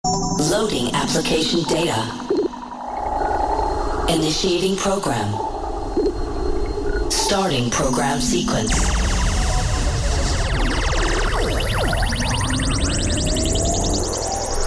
Loading

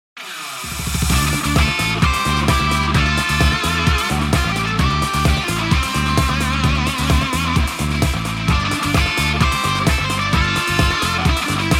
Bass

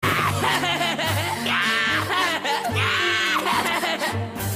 laugh; Isaac Foster

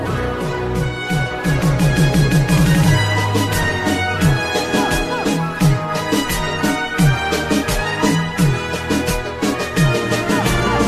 Jurassic Reggaeton

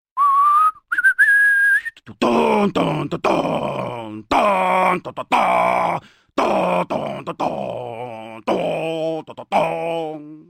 Singing

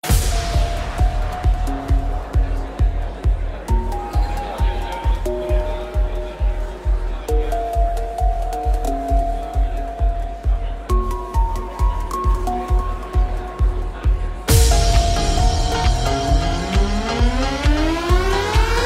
Slow Music

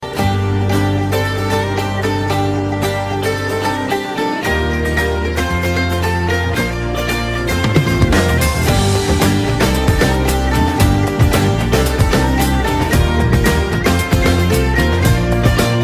Best Guitar

